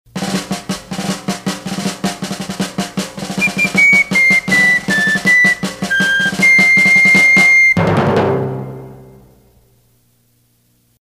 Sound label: Fie and Drums Instrumental; PAMS; KFJZ